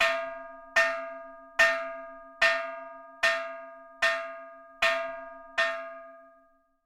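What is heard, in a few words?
fire bell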